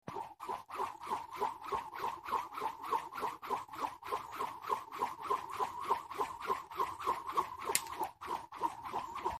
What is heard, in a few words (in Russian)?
skakalka